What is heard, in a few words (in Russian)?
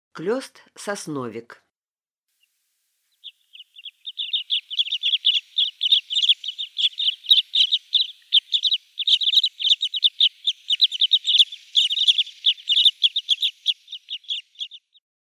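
klest sosnovik golos, parrot crossbill voice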